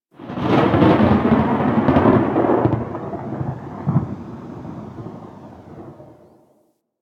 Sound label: Exterior Thunder Claps; Warframe; Ambience; Rail Jack